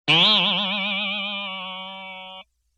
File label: Boing Box Higher